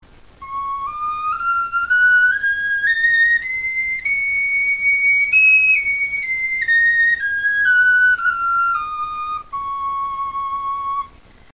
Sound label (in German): tonleiter, knochenfloeten